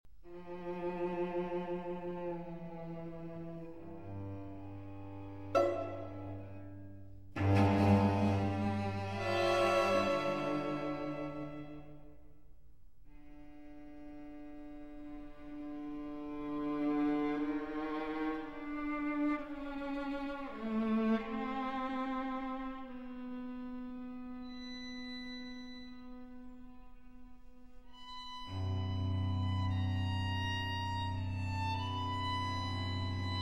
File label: String Quartet, Con dolore